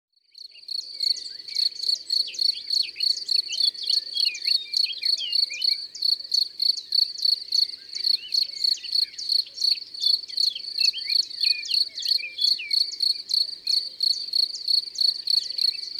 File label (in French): Grillon des champs; abc de la nature